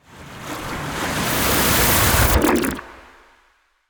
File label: Ice Spell Summoning Call Forth Minions; Arctic Aura